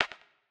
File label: rewind clack